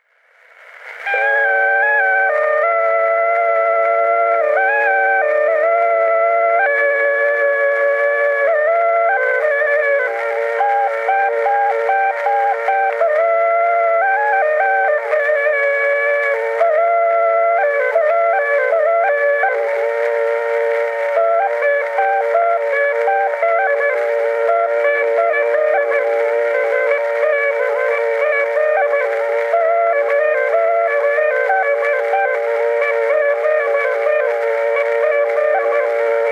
Torupilli Jussi lugu, Emmaste, torupill, folk

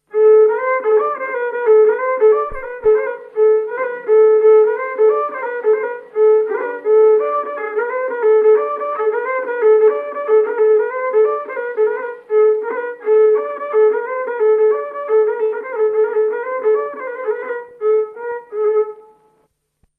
viiul; Urvaste; Sikusarve lugu; Otto Hiiop

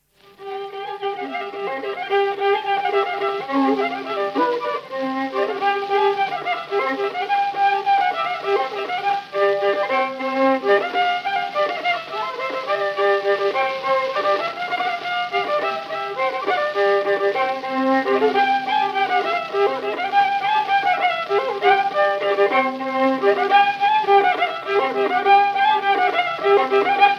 Tal'namaa valts Paistu viiul folk

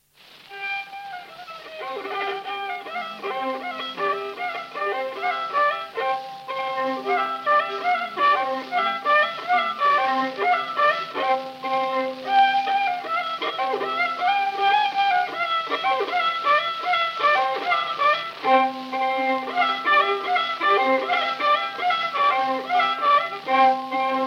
lugu Paistu viiul folk; ulemotsa lugu

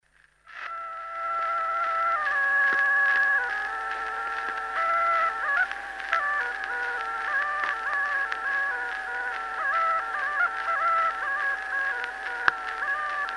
Sabatants, torupill folk; Kuusalu; Jakob Ratsov

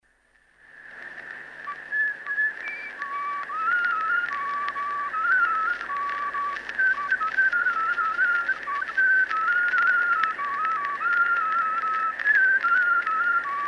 Kitsesarvelugu pajuvile folk; Kuusalu

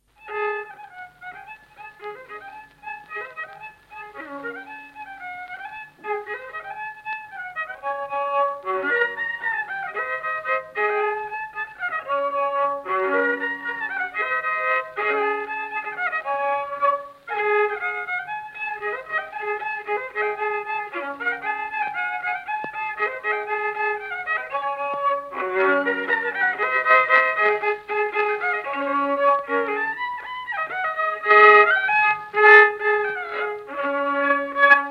Isa polka Tarvastu viiul folk; Johan Room

